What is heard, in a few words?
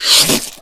smoker launch tongue; Left 4 Dead 2